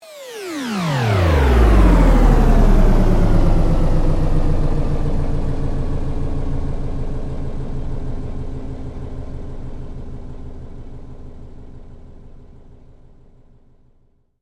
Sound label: Sweep Mechanical Slow; Created sweeps, swooshes and other movement effects; Sweeper; Sweepers (Sound Effects:Sound Design); SoundEffects-Foundation4